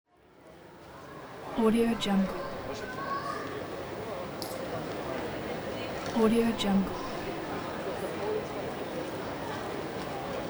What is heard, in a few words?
people noise